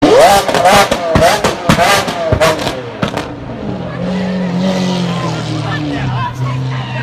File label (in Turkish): lamborghini aventadorun yuksek egzoz sesi; kzlar korkutuyor